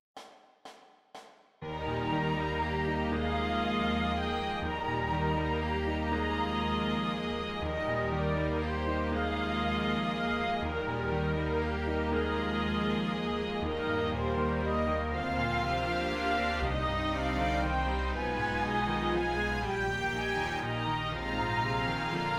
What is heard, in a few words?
Piano Concerto; Tchaikovsky